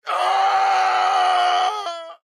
Pain Death; Black Adam

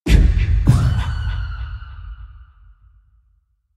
Kikikimamama Scream

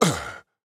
Movement Land; Black Adam

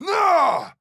Attack Large

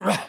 Attack Small; Batman

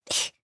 AHurt

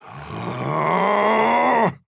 Attack Charge

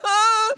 AHurt; Morty

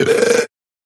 Burp

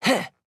Land; Fern

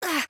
Hurt

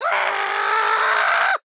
Charge

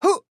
Jump

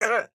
AHurt; Fern

